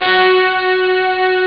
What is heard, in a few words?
string brass; Amiga Soundtracker Sample Packs